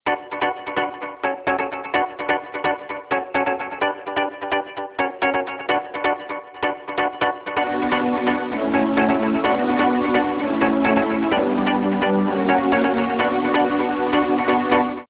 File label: ringtone modern disco